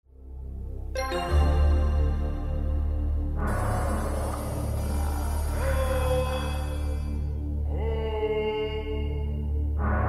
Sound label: Cold Metal Rhythm, Roland D-50; "YAMATANO / OROCHI"